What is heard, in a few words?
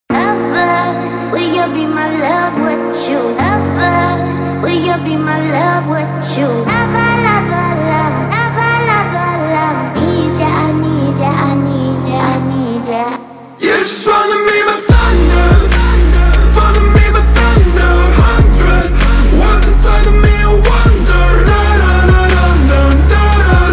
organ russian version